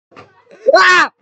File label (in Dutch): harde schreeuw